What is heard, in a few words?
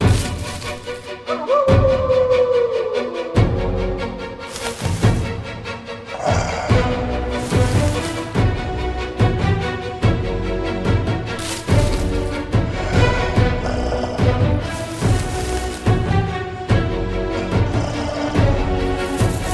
Music Loop; Wicked Tales Dark Red